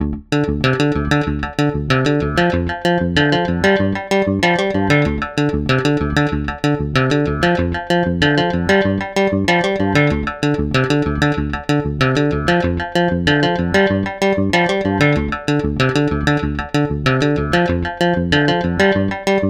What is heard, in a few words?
delay echoes funky guitar echo wet; aas; objeq delay